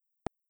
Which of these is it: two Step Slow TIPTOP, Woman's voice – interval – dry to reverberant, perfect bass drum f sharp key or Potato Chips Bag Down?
two Step Slow TIPTOP